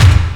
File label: hit, percussion hit